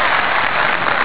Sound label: applause